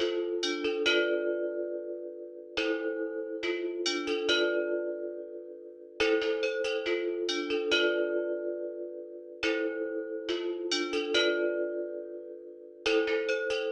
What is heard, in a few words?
140 BPM A Min Vibraphone; trap; Melodies; Loop Stems; Cymatics; Trap Starter Pack; Cymatics Gems Vol 1; Castles